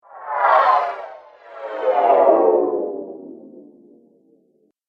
Scary Pair Zoom, whoosh; Zoom 1

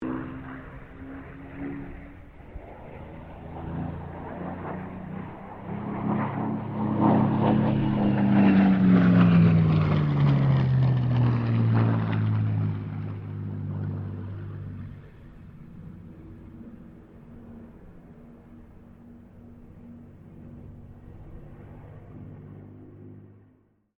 AIRCRAFT SUPERMARINE SPITFIRE MK-XVI PASS; Airplanes

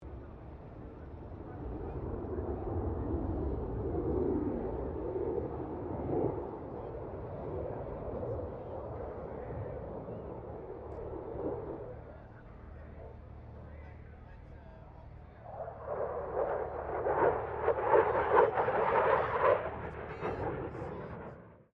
F16 Falcon pass distant, stereo; Airplanes (Sound Effects:Vehicles)

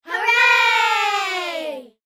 Kids Hooray; Human; Talking (Sound Effects:Voice)

